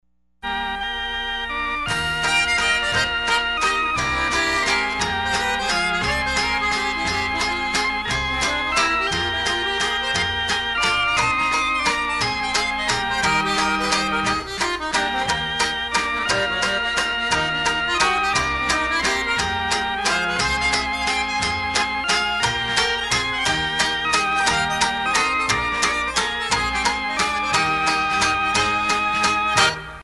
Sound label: Waltz